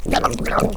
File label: zombies misc idle